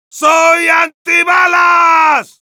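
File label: yell, Spanish; Heavy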